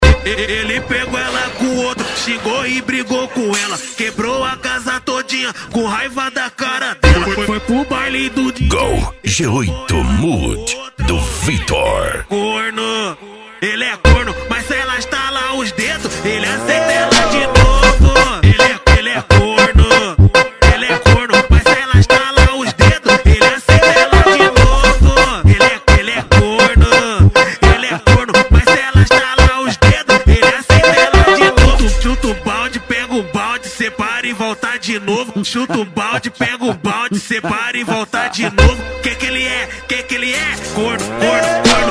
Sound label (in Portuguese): funk ritmado